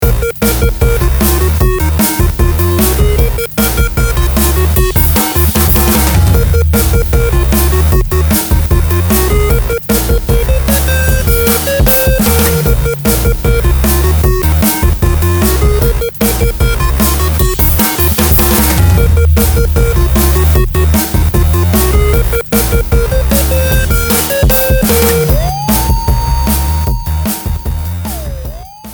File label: victory, music; svencoop; mommamesa